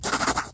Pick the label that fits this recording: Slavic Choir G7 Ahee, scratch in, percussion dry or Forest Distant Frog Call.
scratch in